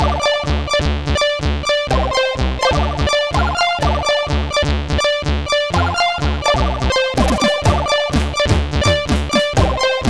Intro Tune, CSG8580R5; Intro Tune 1; hvsc

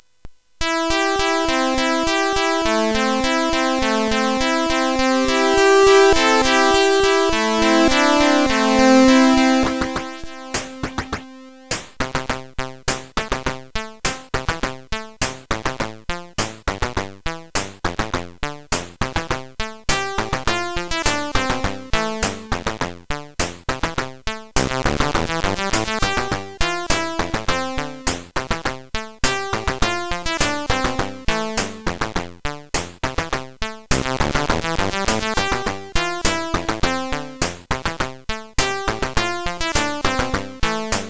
Super Synth Sample II CSG8580R5

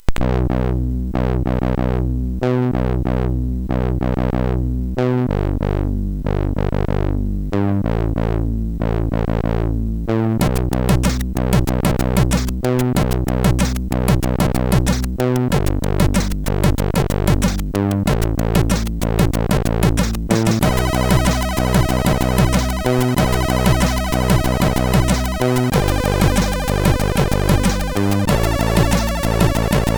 sid MOS6581R4, GAMES; Dark Caves

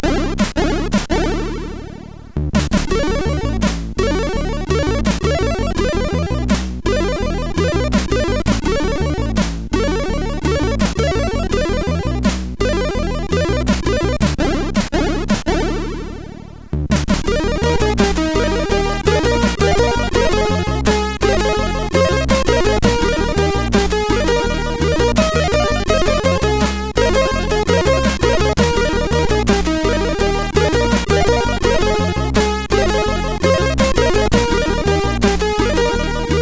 Metal jass, MOS6581R4; sid

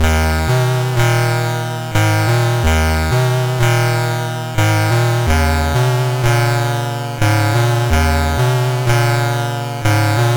Frantic, CSG8580R5

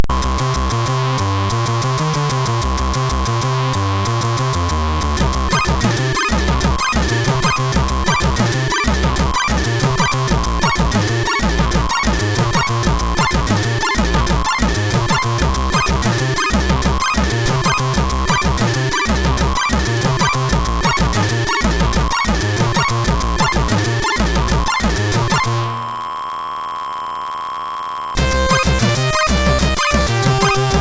Nilfisk, MOS6581R3

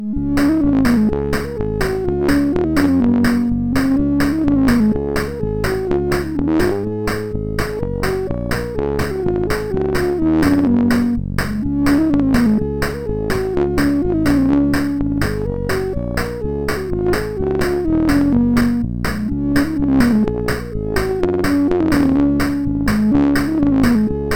sid MOS6581R4, GAMES; Aussie Games

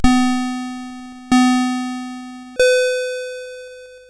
Buggy Boy, CSG8580R5

